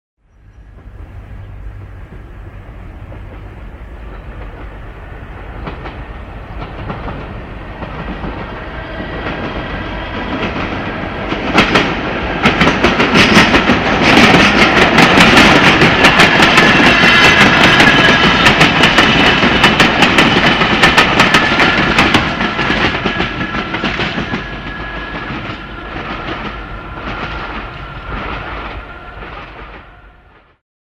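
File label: sound of electric train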